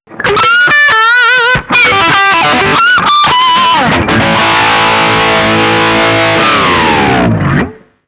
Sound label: bypass; jcm800mv; Marshall Guv'nor Plus